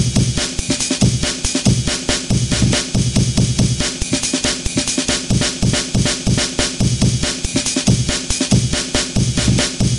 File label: amen break 140bpm